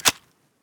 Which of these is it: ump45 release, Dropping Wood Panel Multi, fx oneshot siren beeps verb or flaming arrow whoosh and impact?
ump45 release